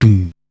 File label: "Tuunn", Korg Wavestation-SR Drum Kit